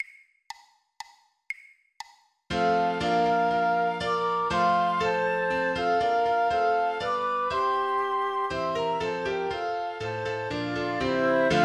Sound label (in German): Flöte Klavier; The Holly and the Ivy; 120 bpm 2x; weihnachten